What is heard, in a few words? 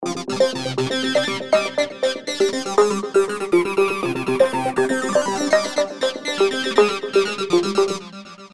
trance sample